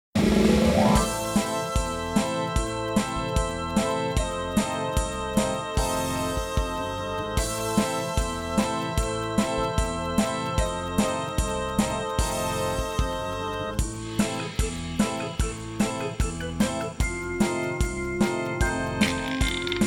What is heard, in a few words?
Music Carnival Midway